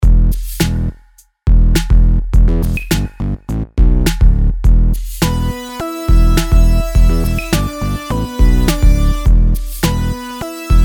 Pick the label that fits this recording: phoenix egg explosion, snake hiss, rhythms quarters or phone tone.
rhythms quarters